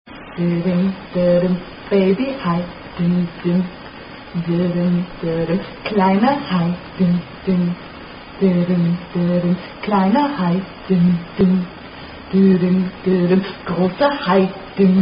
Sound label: German Attack Song